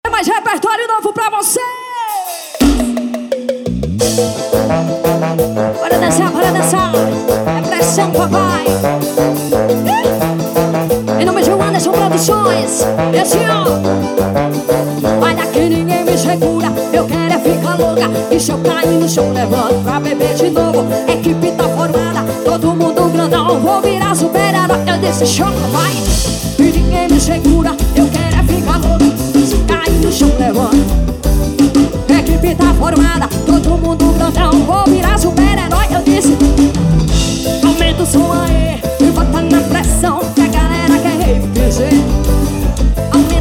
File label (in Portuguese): Rave Piseiro